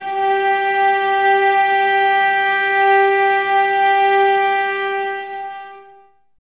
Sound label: STR VAS G, Violas, No Vib; Miroslav Vitous